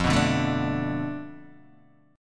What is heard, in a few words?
F 6th Flat 5th F down Guitar DADGAD, chord